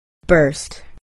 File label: Burst